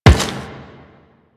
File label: Step Metal, Footsteps; Warframe; Quests; The New War; Kahl